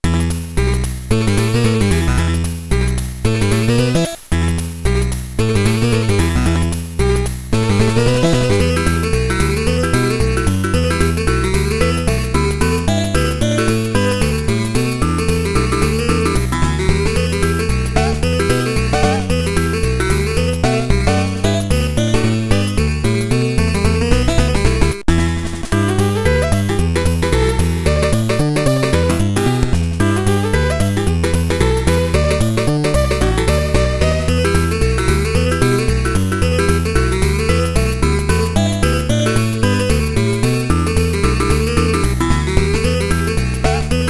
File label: swing jam blues; Metal Gear 2 Solid Snake OST